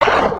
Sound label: hit, creatures organic